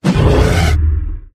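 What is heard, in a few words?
bear dodge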